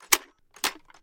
Firearm M60 Rack; Firearms; Zomboid

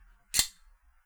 Nail Gun Insert; Zomboid; The Workshop

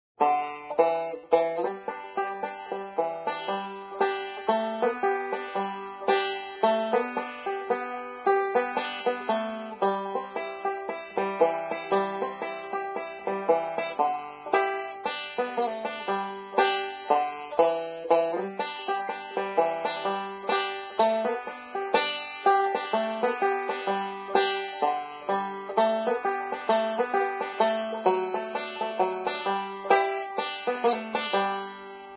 Banjo Will the Circle be Unbroken; Instruments; Zomboid; Lifestyle

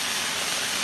flare; Helicopter; crashes bombs misc; Zomboid; Expanded Helicopter Events

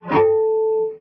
Guitar Electric Failstate; Instruments

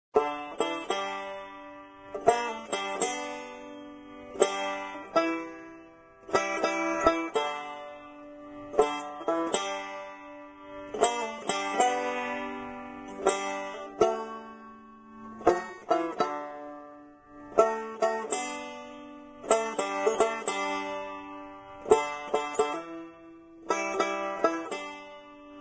Banjo; Zomboid; Lifestyle

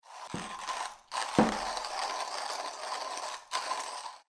Grinding; Zomboid; Biochemistry

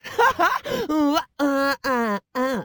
Woman Dance Happy; Zomboid; Lifestyle

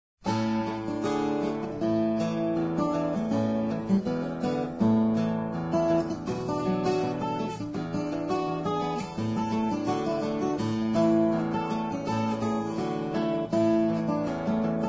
guitar acoustic; Joy to the World; Zomboid; Lifestyle

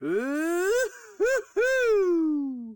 Man Woohoo; Zomboid; Lifestyle

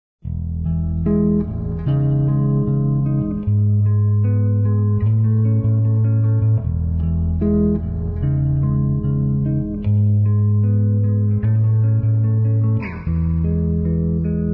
Guitar Electric Bass; Zomboid; Lifestyle

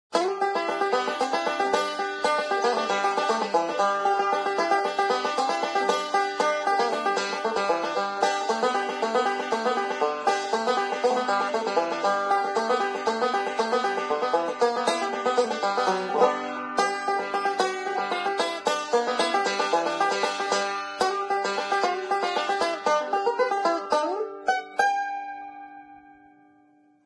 Banjo Cripple Creek; Instruments; Zomboid; Lifestyle

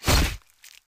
Blade; Zomboid

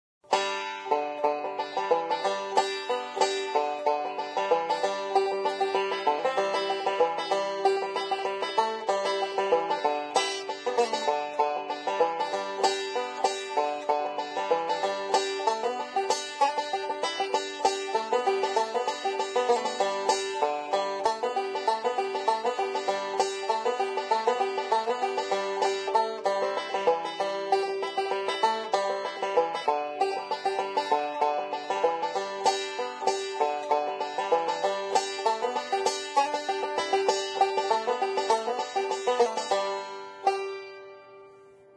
Banjo; Instruments; Zomboid; Lifestyle

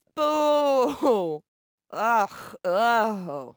Woman Booing; Zomboid; Lifestyle

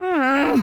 Woman Frustrated; voice; Zomboid; Lifestyle

